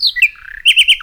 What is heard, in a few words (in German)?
vogel; tiere